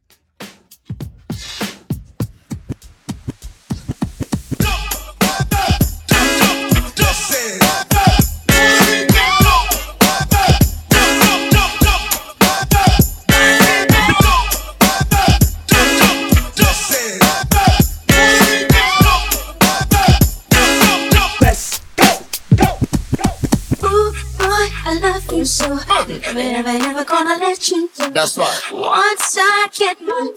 Oldschool Break Acap Out In 100 BPM

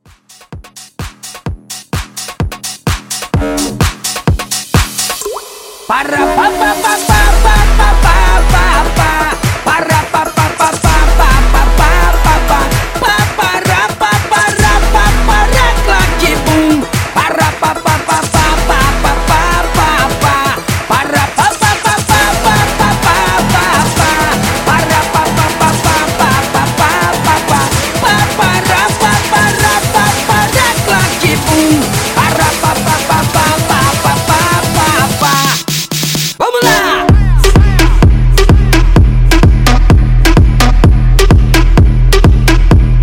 festival, 128

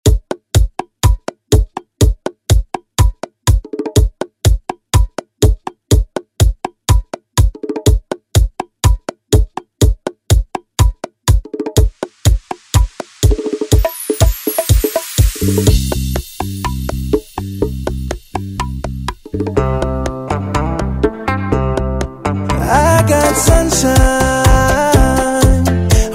Latin Redrum snippets; remixplanet

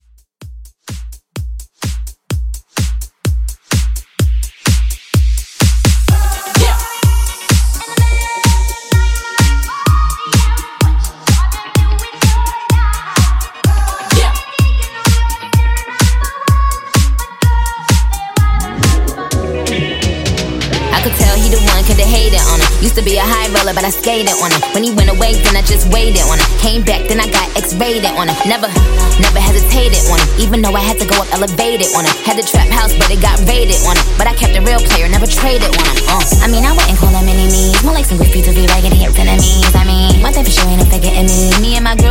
Upbeat Redrum Hype; Remixfreakz

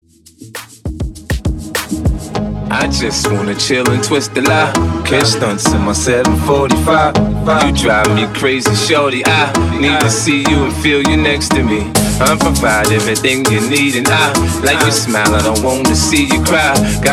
Hip Hop Mashup 100 BPM

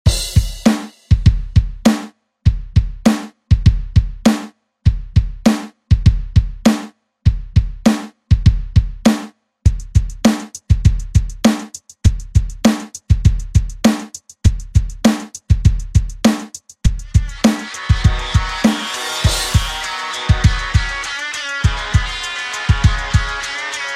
Rock Redrum

